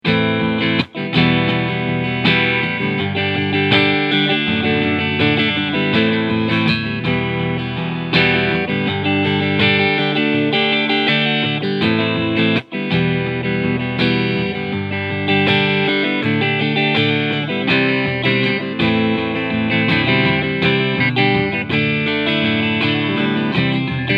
Chapman Guitars ML3 Pro Trad Gold Metallic Clean Sound beide PUs